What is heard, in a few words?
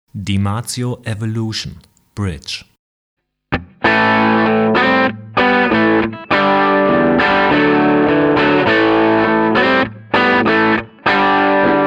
DiMarzio Evolution